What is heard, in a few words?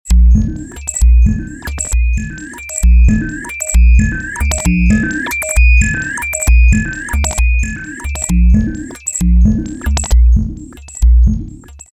neuronal beat